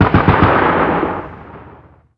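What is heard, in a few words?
gun 50cal distant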